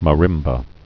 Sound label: marimba